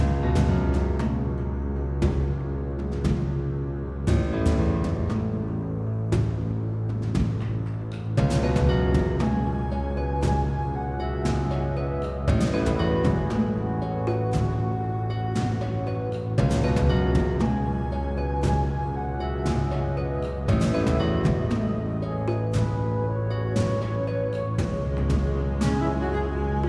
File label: wilderness intense swamp, music